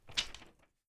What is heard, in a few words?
paper